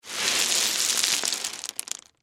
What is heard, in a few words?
Impact Stones Spill; Rocks; Materials; BlastWave